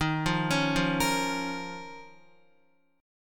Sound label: D sharp 7th Suspended 2nd D sharp, Guitar; arpeggiated; x,6,5,6,5,6; 4F,4A,5C,5G,5C,6E; D#7sus2; JGuitar